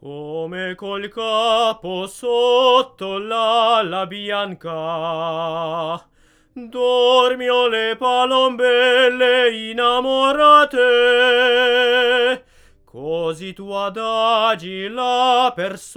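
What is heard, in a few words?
domra, Neumann TLM170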